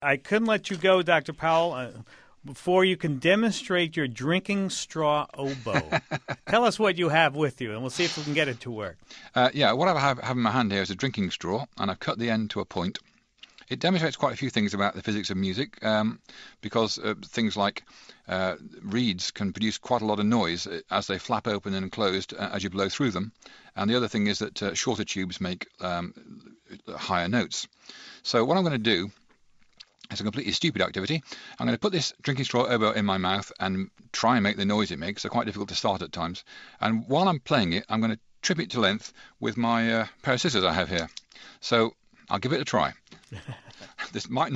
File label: do it yourself drinking straw oboe; scifri snacks